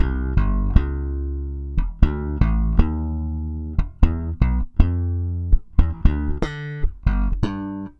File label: bass salsa